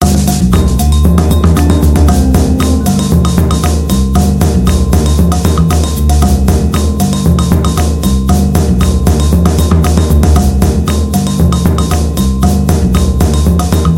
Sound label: Samba Limbo END; SO Clips